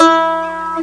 A Note, guitar; The Guitar Tutor; Educational

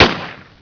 handgun

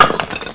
Concrete; worms dc; tykes

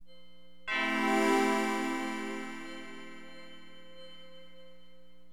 TUBULA, Keyboards; HipHop; LiveAct Pool 1

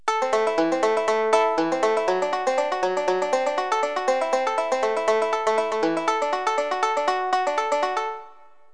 banjo

